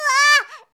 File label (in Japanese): shonen himei